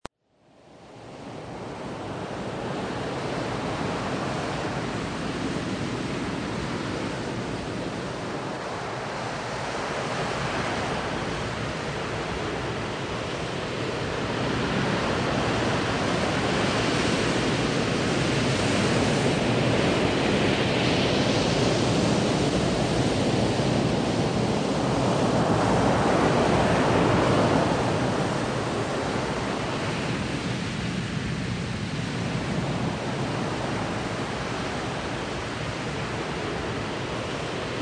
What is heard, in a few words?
Ocean Wave